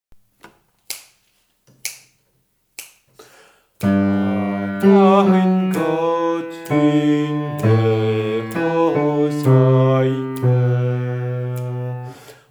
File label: allein gott basse